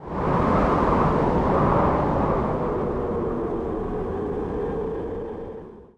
tornado gust; Tanks

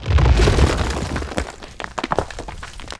rockslide; Tanks

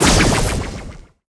shot; gravitywell; Tanks

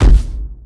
gravity well pop; Tanks

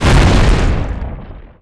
coal launch; Tanks

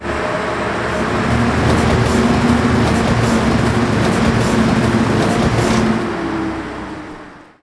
snowblower fire; Tanks

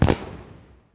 fireworks pop; Tanks

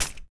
coal bounce; Tanks

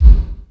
logo bounce; Tanks

